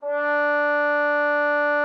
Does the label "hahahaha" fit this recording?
No